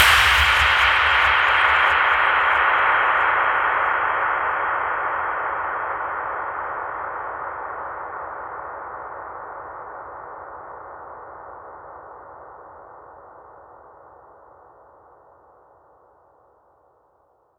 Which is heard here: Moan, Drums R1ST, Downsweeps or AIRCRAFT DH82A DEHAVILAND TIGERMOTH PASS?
Downsweeps